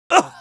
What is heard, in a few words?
damage, pain; dialogue; mohaa